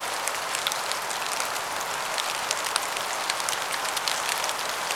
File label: gentle rain